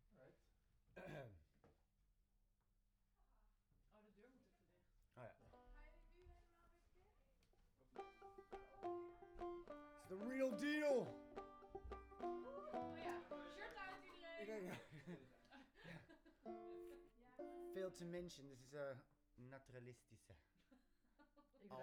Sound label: Chordz